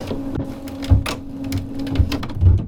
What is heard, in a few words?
servos printer shutting down; Robotic Lifeforms 2